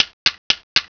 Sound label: Ticking; RivalBall for Macintosh